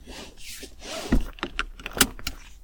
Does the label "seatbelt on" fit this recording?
Yes